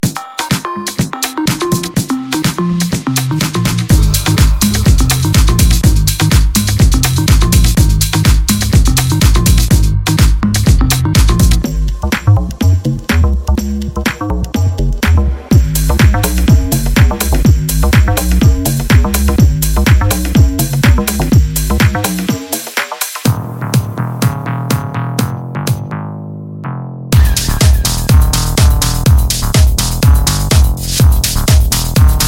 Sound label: Classic Drum Loops